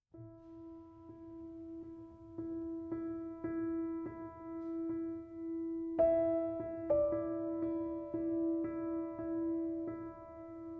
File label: Naked Piano; Out of the Dark